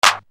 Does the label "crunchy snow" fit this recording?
No